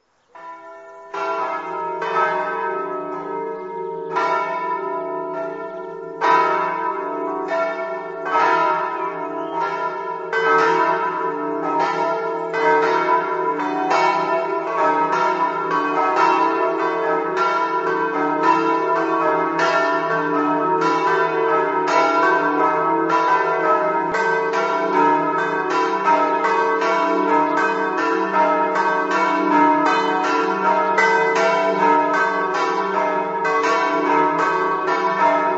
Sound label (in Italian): campane; rive